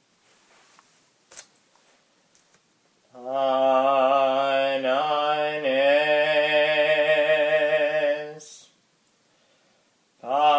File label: Aposticha 1 - Tone 1 Slow, byzantine chant